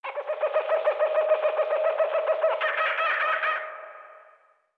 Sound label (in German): Tropenvogel